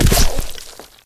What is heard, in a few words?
hit